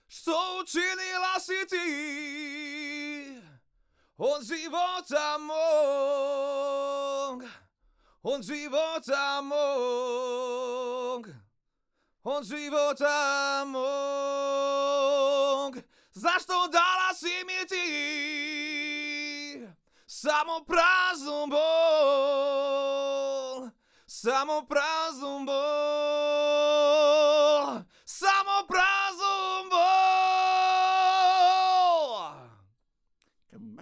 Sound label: AKG Cardio; Reaper project